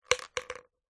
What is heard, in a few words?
leisure toy marble drop into plastic container